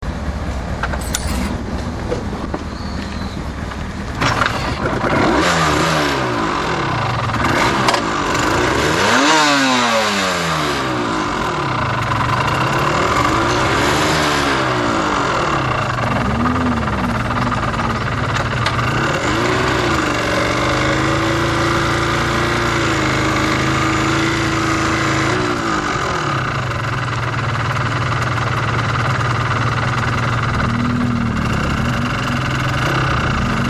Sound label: rgv250 kick